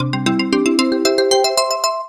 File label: win; Grand Fruits